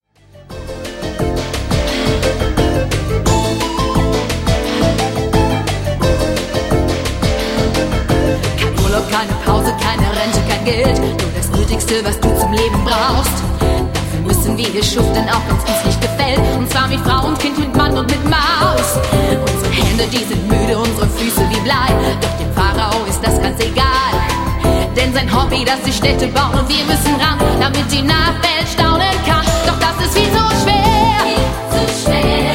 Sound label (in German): Rock